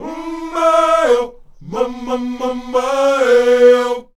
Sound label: UM MAY, Afro Chants; Roland LCDP11 Africa VOL-1; Um May-O